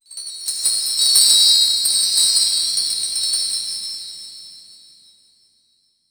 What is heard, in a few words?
environment temple glitter; Aragami